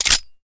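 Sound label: pistol 1911 reload empty chamber; gmod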